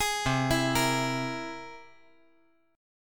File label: C Augmented 7th C down 5G, 4C, 5E, 5A